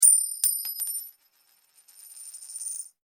Coin Drop, notifications; NGM WeMove Miracle

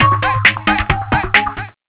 Cisco Techno; Unified-Communications-Manager-12.5

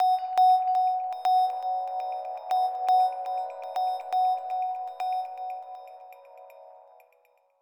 Time Signal; Bluboo; S8

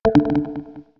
Undock, ui; Coolpad; C558

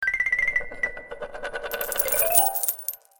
Money Fall, notifications; Vivo X5 Pro

